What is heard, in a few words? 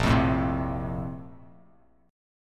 Bb Major 7th Suspended 2nd; BbM7sus2 strummed; JGuitar